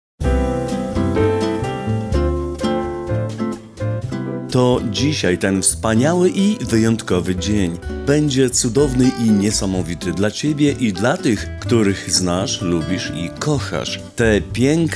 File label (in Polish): osiemnastka samba